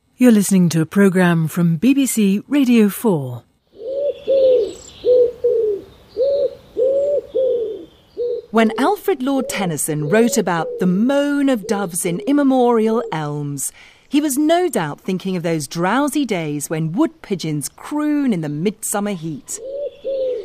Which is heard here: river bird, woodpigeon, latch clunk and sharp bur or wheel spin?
woodpigeon